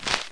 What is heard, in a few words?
Step; Notrium